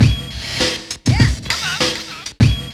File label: LOOP; E-Lab; X Static Goldmine 2; akai